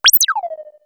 R2 ANGRY; Best Service Dream Experience; SF+EFFECTS

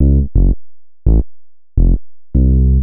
Bass, Futurist Drum & Bass

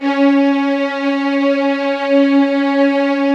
VLN C#4, LOUD VIOLINS; Q Up Arts; Denny Jaeger Master Violin Library

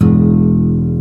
E0 MARTIN

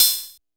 kit, Yamaha Motif-ES8

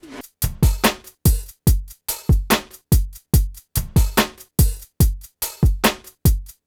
PHATT BEA

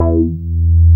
BAS MINI, Mini-Moog

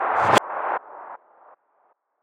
Reverser; Electronisounds

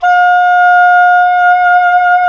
SAX SOP MF, Soprano Long

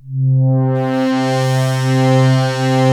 RM19 FILTER C2, BASS; Best Service Dream Experience

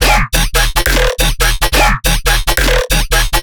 bass loop E 140; Virtual Riot

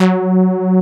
SLAM F#3; Best Service Alpha Dance vol.2; SLAM SEQ.2

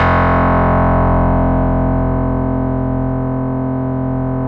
RESO METAL F1, Yamaha VP-1; Music Store

